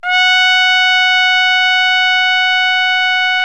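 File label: BRA TRUMPE, trumpet, brass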